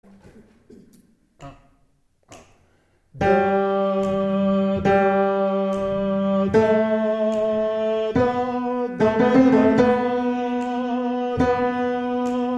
Tenors

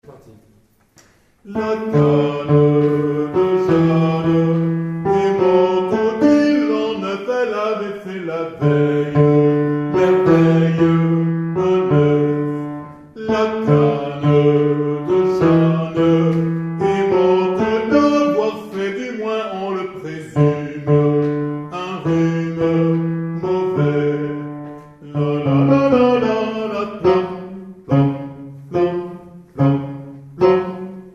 Hommes

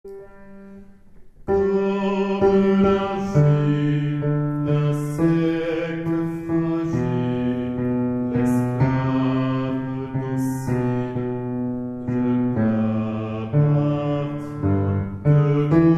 Basses

